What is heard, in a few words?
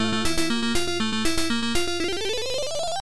Speed Up; Wacky Gator